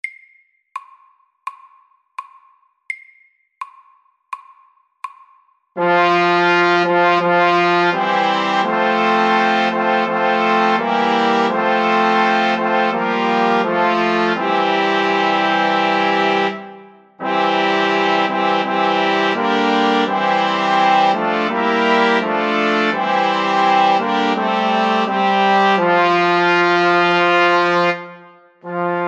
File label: Fanfare for tre SLOW 84